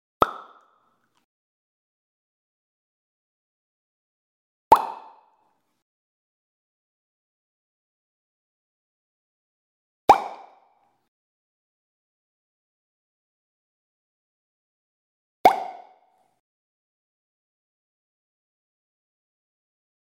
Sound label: Pop Gun